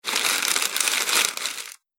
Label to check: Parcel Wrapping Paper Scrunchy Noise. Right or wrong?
Right